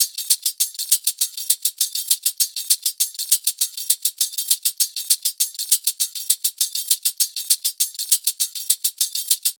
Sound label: hat loop 100, Drums; Pop; Hat Loops; Slate; SD PASADENA Pop Sample Pack